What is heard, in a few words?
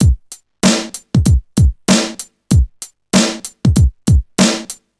96 bpm drum beat c sharp key